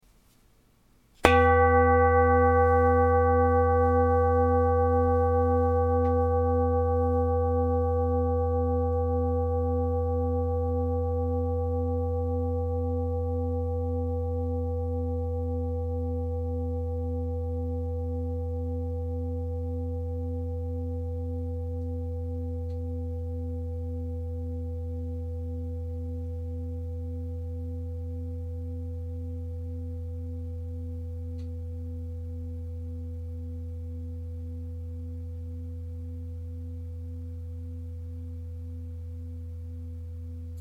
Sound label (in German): Klangschale